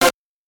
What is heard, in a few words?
synth